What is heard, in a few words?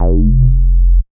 bass classic rage F; Polyend; ARC Noise